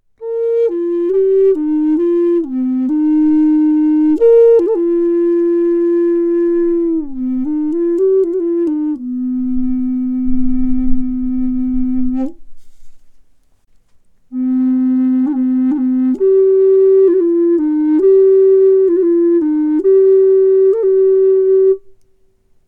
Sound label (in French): flute amerindienne